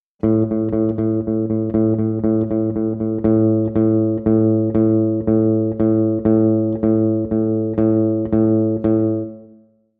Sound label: no eighth triplet eighth triplet tied